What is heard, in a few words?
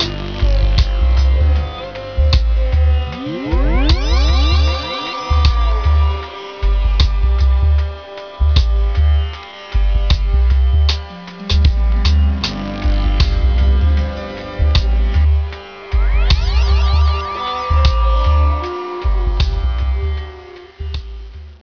reggae raga; on-u